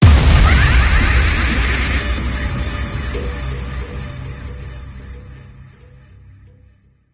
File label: Death Jingle No Voice; Deathwish